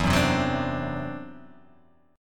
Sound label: E 7th Suspended 2nd Sharp 5th, E, down